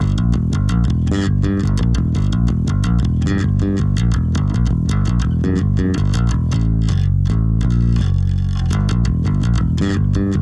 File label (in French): Exemple Plan Doigts Slap Run Faster